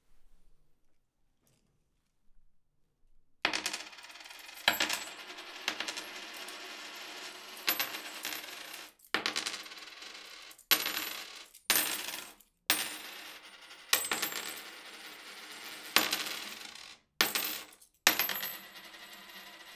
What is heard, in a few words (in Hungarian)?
asztalra apró dobálása, pénz; angyalfold